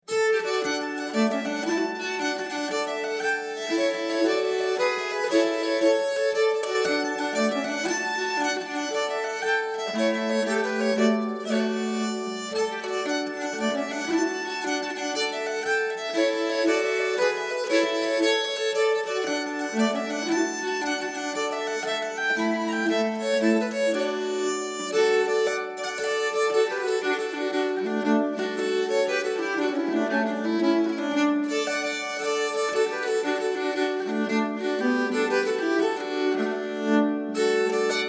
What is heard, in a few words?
Polska fran Jamtland, nyckelharpa